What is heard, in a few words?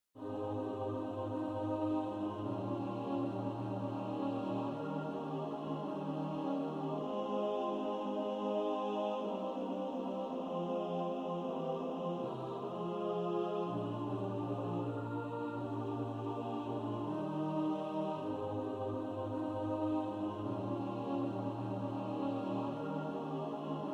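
alto, Practice Music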